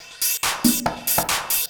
hard beats 140, hard trance beats

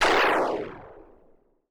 SPACE FX 140; SpaceSounds

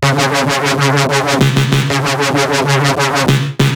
synth 128 C#; Electro; Anthemic; synth loops

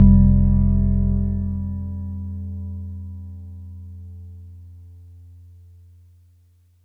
F2, Chimes; Orchestral; FL Keys

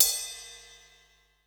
RIDE, CYMBAL; drum

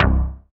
BASS STER.

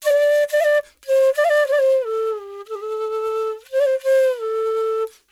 ETHNO EXTRA 90; ethno2

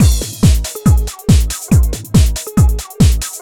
TECHNO BEATS 140

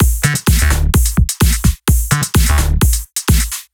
drum loop 128 Full; Anthemic

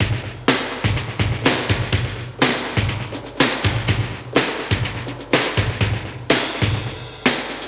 125 Classic Breaks, 125

